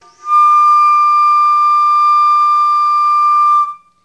D sharp 6, baroque flute

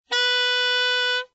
C sharp 6, tenor saxophone

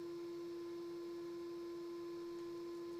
G4 normal, clarinet

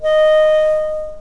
D sharp 5, flute; modern

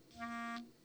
clarinet articulation

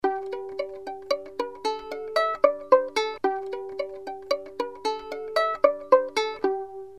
pizz

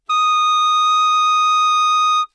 F7, tenor saxophone

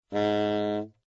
Bb3 moderately soft, tenor saxophone; Bb3 dynamics

